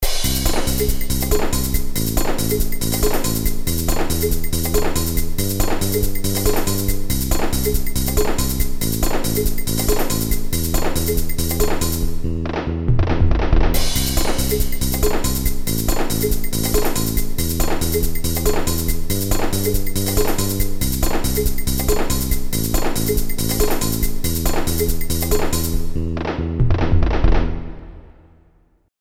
Nada Dering Nokia Jadul Trance

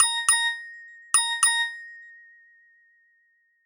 Nada Dering Nokia Jadul Message

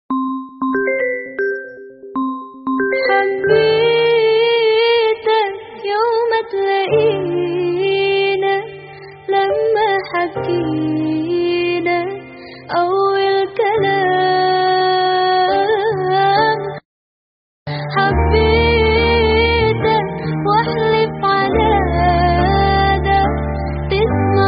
Nada Dering Islami; Versi Samsung

